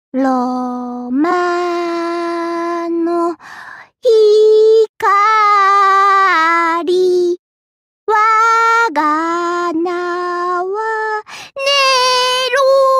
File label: singing; fate grand order; saber nero claudius